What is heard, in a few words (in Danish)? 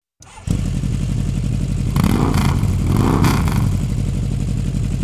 motorcykel der korer vaek